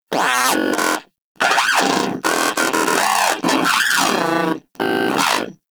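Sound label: Moa Aggressive Vocal Longing; Warframe; Pets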